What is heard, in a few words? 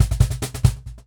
Cajon Fill; musicradar